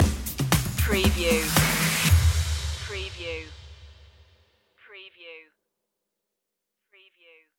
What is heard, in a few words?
time with you stinger